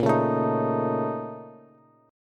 Bb Minor Major 11th Bb, Bass, Bass Standard; BbmM11; strummed; 18,16,19,20; JGuitar

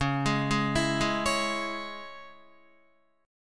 Db Minor Db Guitar; arpeggiated; JGuitar

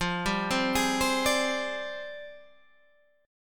F 6th Suspended 2nd Flat 3rd F, chord

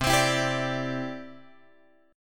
C-Major Add 9th, guitar; Cadd9 Chord {8 X 10 9 8 10} strummed

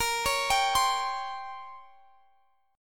A sharp Minor 6th Add 9th, Guitar, Half Step Down

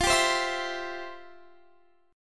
F Major 7th Suspended 2nd, Guitar, Standard; strummed; capo 9; chordshape

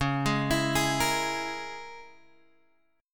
Db Minor 6th Db down Guitar Open G

